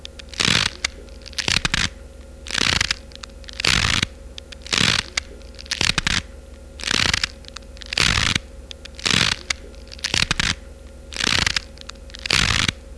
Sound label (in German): schneekugel